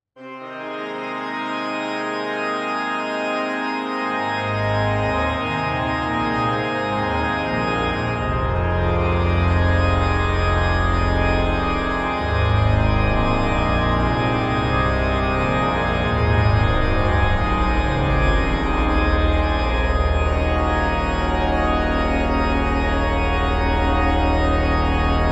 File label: carillon after the bells of ulm cathedral